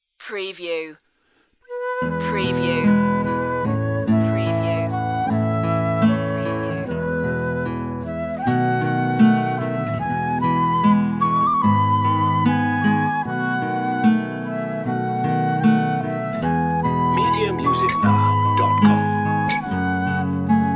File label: orchestral flute version